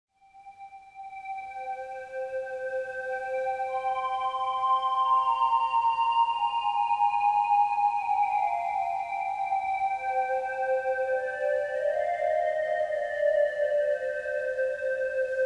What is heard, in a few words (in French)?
Désir violine; Musiclassique; axiel-son